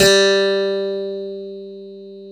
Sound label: HARPSIC G3